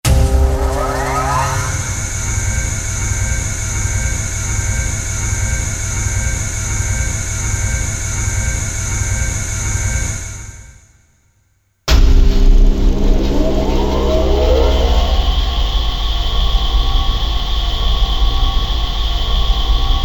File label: Dynamo, Korg DSS-1, Industries